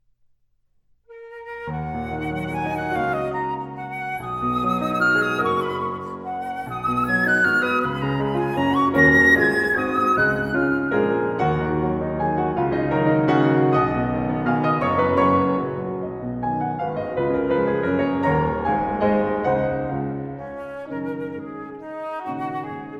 Clarinet Sonata In E Flat Major, Appassionato, ma non troppo Allegro